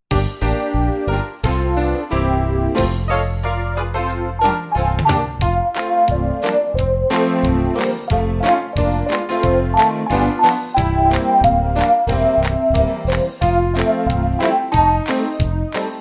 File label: song only music